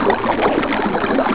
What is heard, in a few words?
fountain; irish